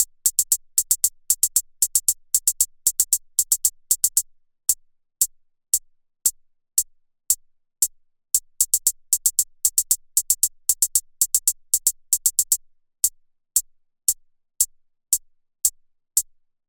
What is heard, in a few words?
HipHop HiHats